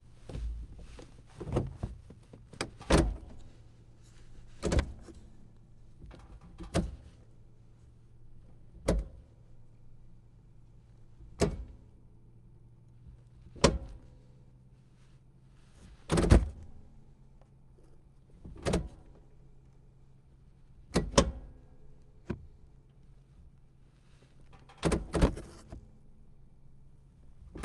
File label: polaris sportsman xp1000 gear selector; Sonniss GDC